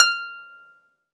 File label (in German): HARFE F3; Best Service